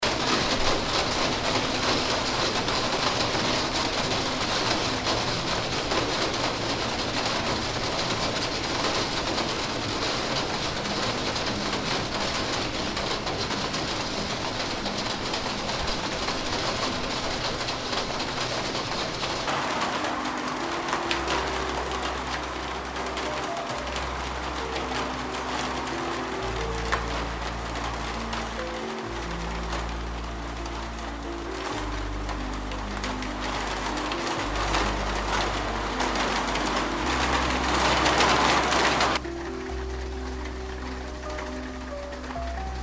Rain On Roof; realistic atmo; realistic atmo pack

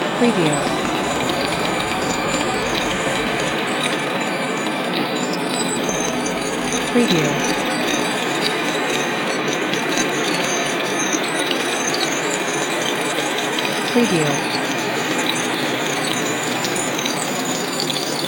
Intense Noises, creepy ambient music

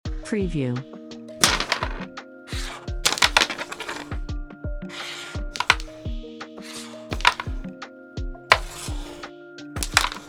CARROM BOARD SOUNDS MUSIC WATERMARK

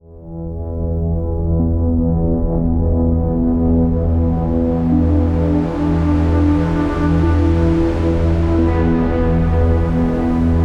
Ocean, ambient cinematic; ambient cinematic vol1

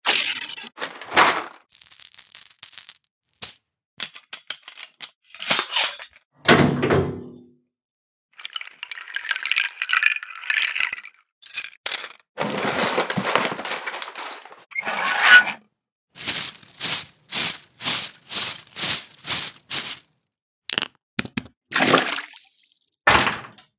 Organic Misc; organic ui building game sfx; APPlicable Sounds

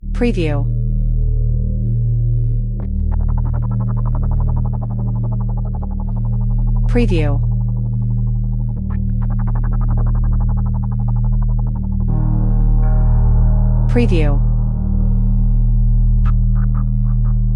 Dark Forest, spooky ambient music

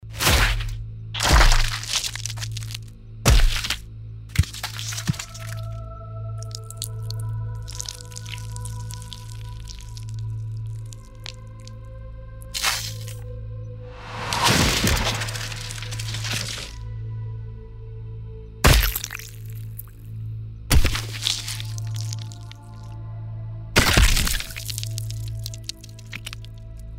Foley, horror; ultimate horror package

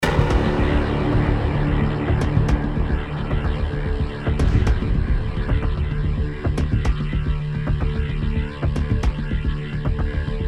epic music; epic music pack vol 1

